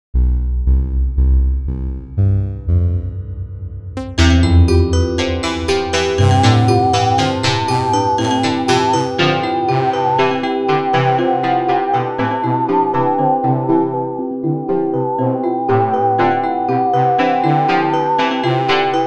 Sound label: beat